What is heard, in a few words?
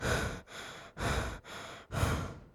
Breath